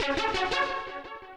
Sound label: 175 D&B Synth Ebm; Dance DJ